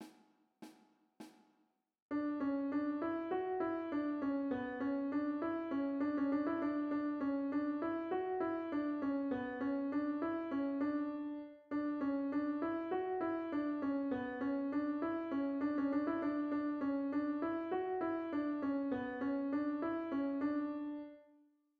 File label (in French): FARANDOLE en croches